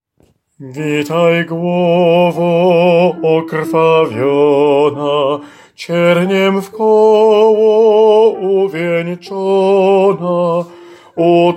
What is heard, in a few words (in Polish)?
SOPRAN; Witaj glowo